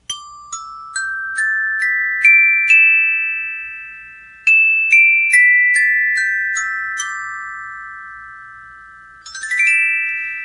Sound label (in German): glocken